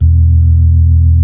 B-3

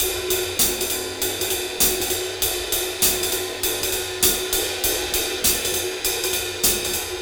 Cym Beat 99 BPM Cym; AMG - Keith LeBlanc Phat Butt Jams

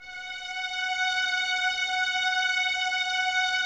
F#5, 11 Violins, Soft; Miroslav Vitous

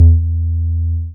BAS MINI, Mini-Moog

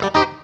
FUNKY, Grooves

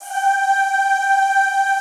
ZZZ G4, Classical Choir; Best Service; Extended Classical Choir

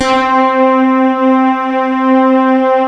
LA SYNTH

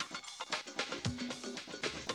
Classic Drum Loops

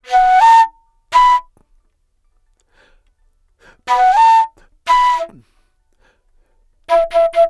Wood Flute, Asia

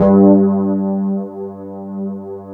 WET RD G1 L, Keyboards Old School; USB Soundscan vol.09; AKAI; WET ROAD

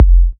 F, kicks; markus hakala kicks

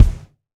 Maple Kick; Roland BK-5

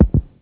HEART BEAT; AKAI S-Series CD-ROM Sound Library VOL-8; SFX

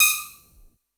attack D#5, Yamaha VP-1; Music Store; VP-ATTACK

